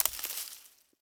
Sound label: weed step; Golden Crayon Sounds